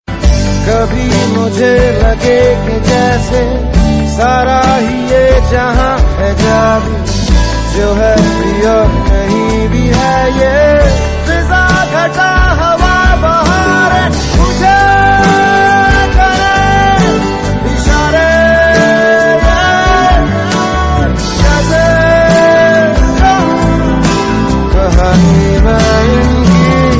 rockstar guitar

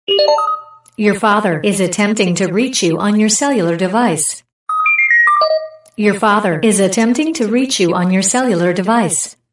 beep sound effect

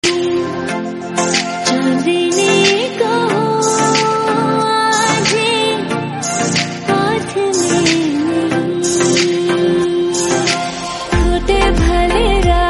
female, hindi

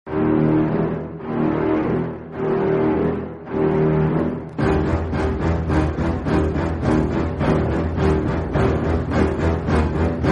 theme instrumental bgm; jaws

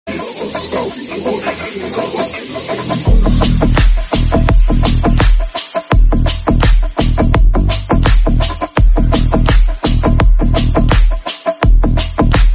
bgm; demeter

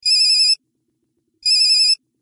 retro nokia

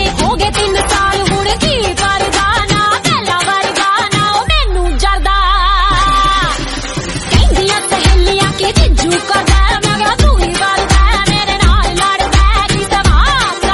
new song

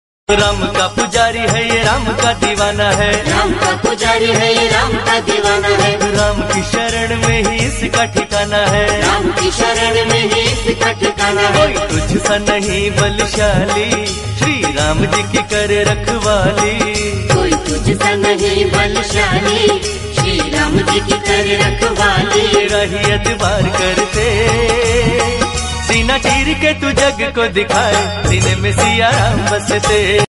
hindi god

